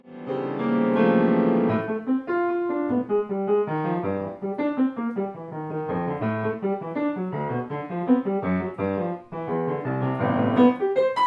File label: MUSIC IMPROVISATION EXCERPT THREE; 52s